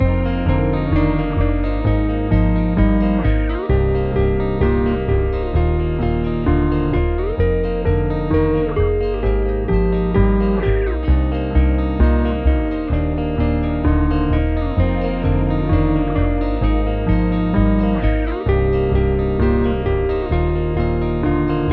130 BPM D# Maj, Guitar Loops; Limelight; Cymatics; Journey Guitar Loops

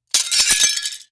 Glass Break; Fairlight Sound Lib

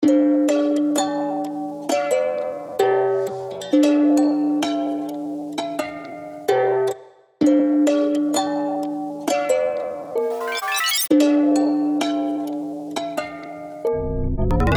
130 BPM C Min Harp; Melodies; Wonky Trap; Loop Stems; Cymatics; Killed This

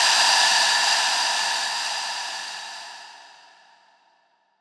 Dubstep White Noise Down - 150 BPM; FX; Cymatics; Dubstep Starter Pack

